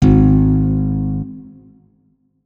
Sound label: notes, guitar; Hideout Furniture